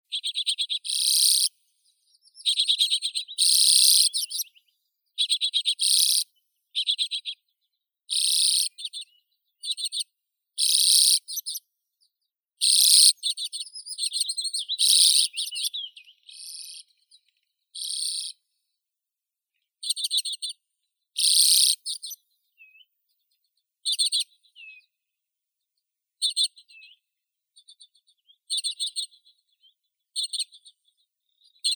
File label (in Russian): chechetka obiknovennaya acanthis flammea